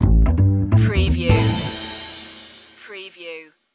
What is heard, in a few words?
swing stinger